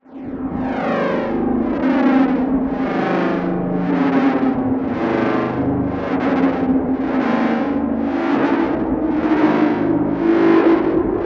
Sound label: slow scanner